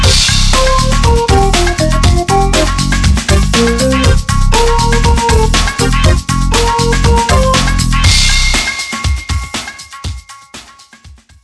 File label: swing dance